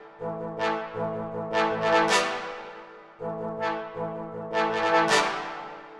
Trombone stacc; тромбон

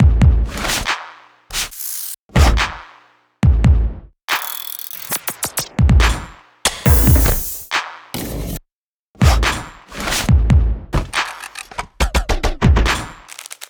Drums A, trap; Trap (Трэп)

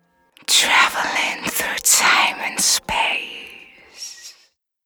Travelling Dry, ambient

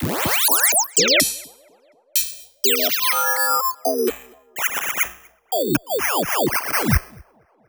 Formant Fx, break beat

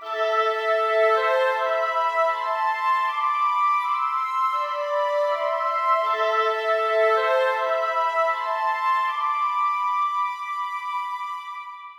Winds ens; Winds Ensemble; film music; woodwind; духовые инструменты; Movie Score; 80BPM

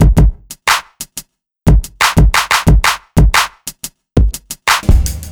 Electro Clap

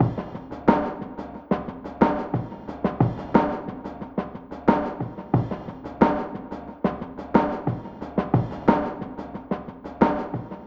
Industrial, chillout industrial; сэмпл ударных

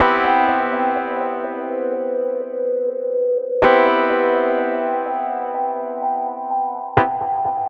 Sweep EP, electro piano

